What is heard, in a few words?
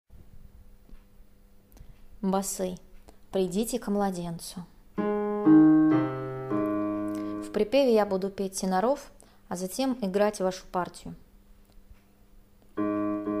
bas; Pridite k Mladencu